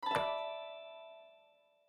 Cross Bomb Awake; my cute commissar 2020 gamerip

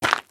Cave Footstep; The Inn

